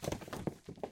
Rock Move; Puddle; puddle plus ios gamerip 2018

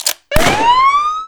Boss Attack; NYC

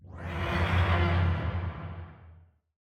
Head Appear; Silence Incarnate

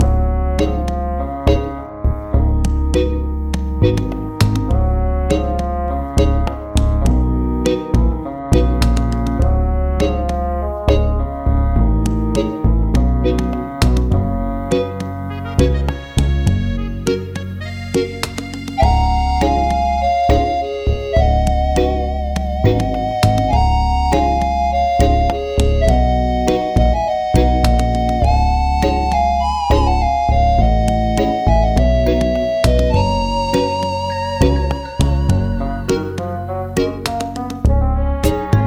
Situation Relax Loop

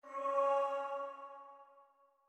Chime; chime sharp

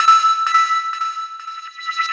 Super Jump; Alterna SFX

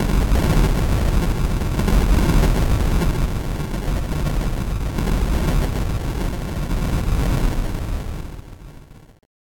Bossdeath Explosion

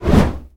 Fire Whoosh; Puddle; puddle plus ios gamerip 2018

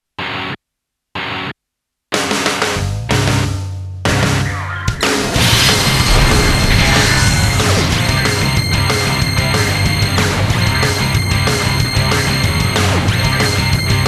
Instrumental Version; Great Future War

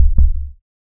heartbeat; Cruelty Squad

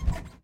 Capsulte Bounce Medium

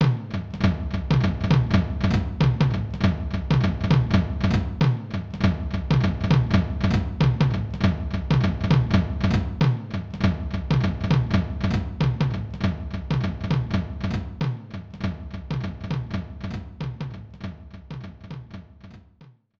Barrel Blast Jungle - Intro; Mini Mario & Friends: amiibo Challenge

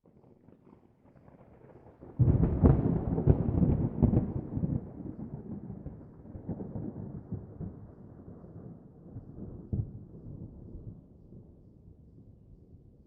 Ambient Distant Storm Mood; gamerip; escape from russia mobilization

